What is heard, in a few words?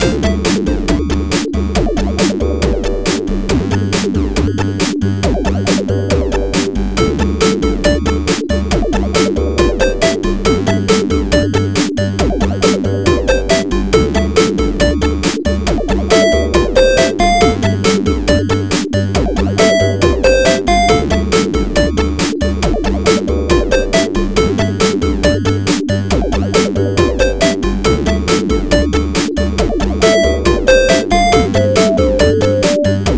Loading Theme (PO-20 Chiptune)